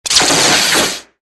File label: saber block; effets speciaux; Blocages